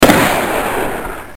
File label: Ric gun